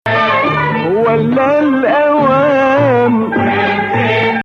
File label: Nawa Bayati; Maqam Nawa Athar; Bayati 5